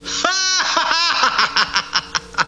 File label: laugh; StarTrek